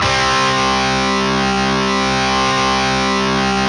GUITAR, POWER GT

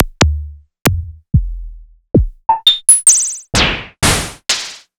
ARP Odyssey Drums

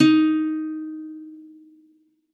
Soft D#4, Campfire Nylon guitar; Worra's Campfire Nylon

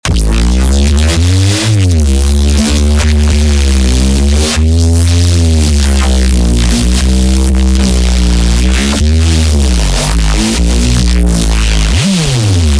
grunchy bass

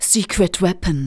vox, Techno Trance; Ueberschall

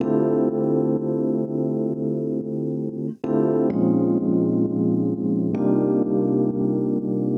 Epian Loop 130; Equipped Music; Loungin House

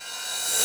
Akai XR-20, Drum Machines, Cymbals

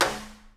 SNARE, Live Snares

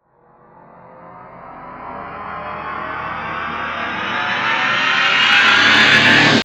sfx sci fi; Masterbits - Switch FX

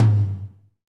TOM TOM, Roland

